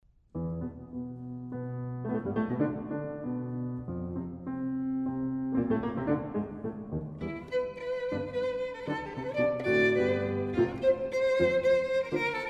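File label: violin sonata; godard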